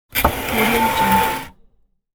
squeaking wooden chair squeaking on the tile floor